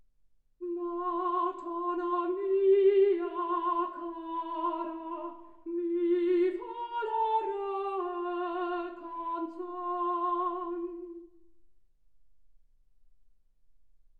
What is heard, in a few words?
soprano